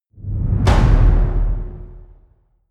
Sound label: big drums